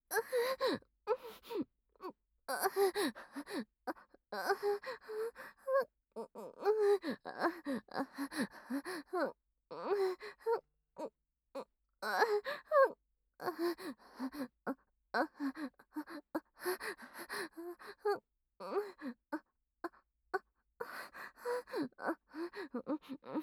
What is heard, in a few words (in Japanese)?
正常位喘ぎ; アドリブ集